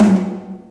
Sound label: snare, Real